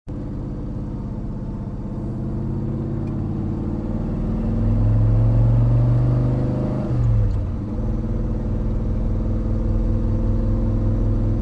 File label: Accelerate